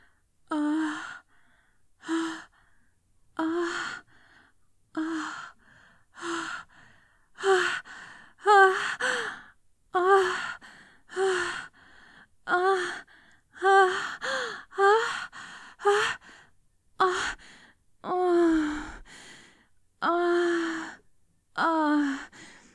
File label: female orgasm